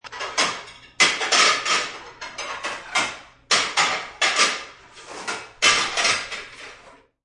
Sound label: Stacking plates; Kitchen; Household